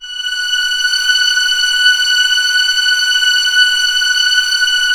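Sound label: STRINGS F#4 FF, Orchestra; Sound On Sound - Peter Siedleczek's Orchestra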